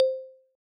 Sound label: Ping